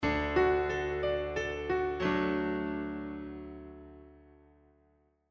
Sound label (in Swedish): Tonartsgivare D